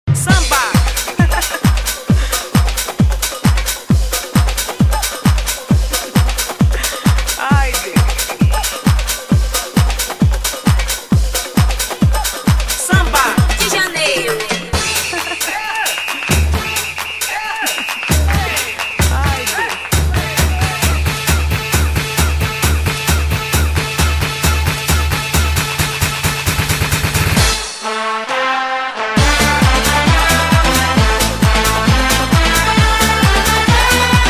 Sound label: Samba; Samba de Amigo